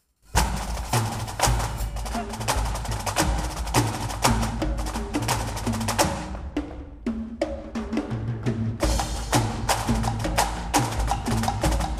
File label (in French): caisses claires ecossaises